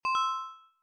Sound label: success task